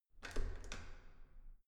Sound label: entrance door handle use normal